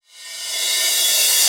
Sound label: Reverse Cym, Cymbals